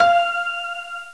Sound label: piano